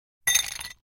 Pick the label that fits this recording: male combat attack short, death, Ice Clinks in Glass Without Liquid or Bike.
Ice Clinks in Glass Without Liquid